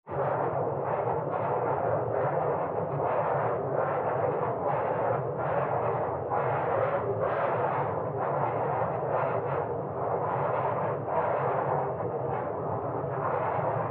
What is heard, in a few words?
Cold Winter